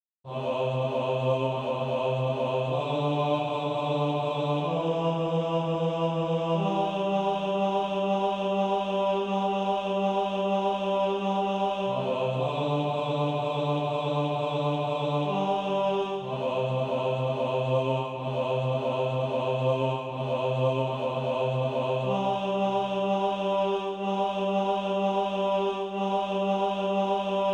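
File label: bass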